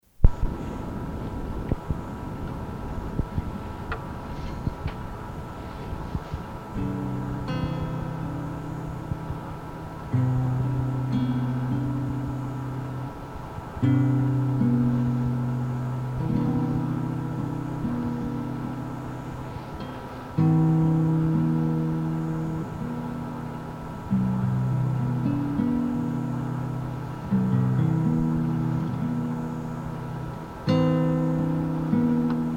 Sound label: Guitar